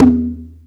gedombak anak doh

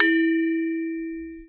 gong A, keromong

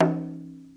kompang tak

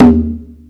gedombak ibu doh

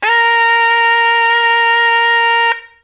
serunai 1879hz

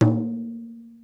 rebana gong

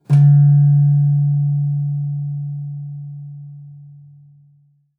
slanthem; Saron

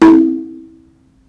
gendang anak

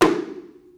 kompang

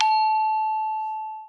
gong A, keromong

